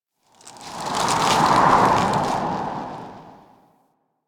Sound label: Tower Rustling Leaves; Warframe; Quests; TheNewWar; Ambience; Cetus; Unum Tower